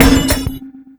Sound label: Hit, Fx; Sonic Foundry (Sony Creative Software); Esoterik Beatz; Bello